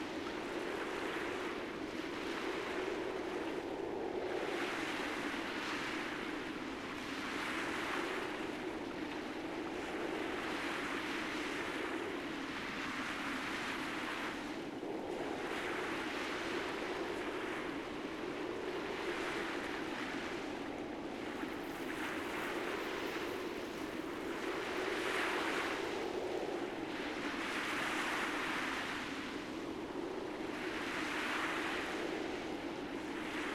Sea Shore

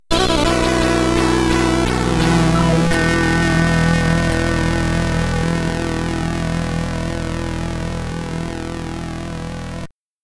Game Over (SID Stereo); Spellbound; Commodore 64

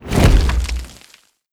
Explosion; Lucius III

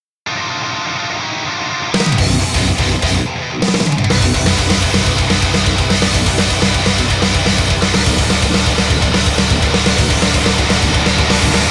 Warning!; Danmaku Unlimited 3

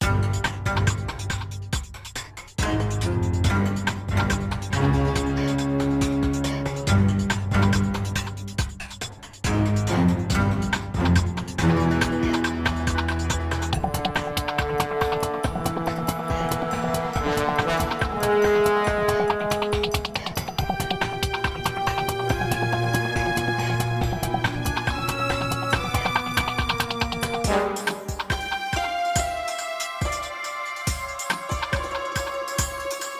Stealth Loop; RDX

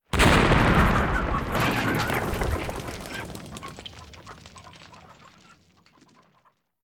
FARM BLAST EXPLOSION

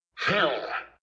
Human Attack Voice

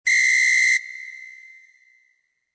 whistle; Flying Metamorphosis Queen of SM Bar Fuate Co; android

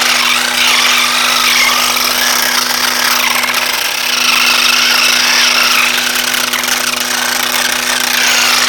Electric Motor; bad piggies; android; mobile; windows

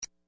Highpitched Compute

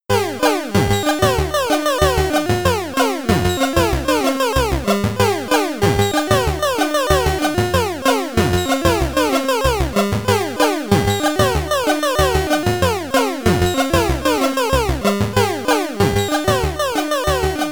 Ending, SID Stereo; Pi.R Squared; Commodore 64

